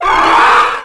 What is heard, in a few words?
Demigod Falcon Squeal; Playable Tutorial Level